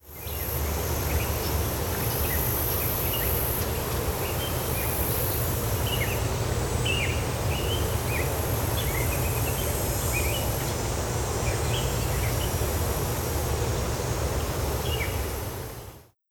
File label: Miami Birds